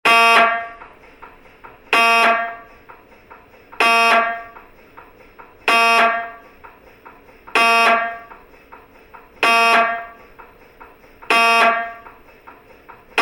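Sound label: sounds of MRI tomography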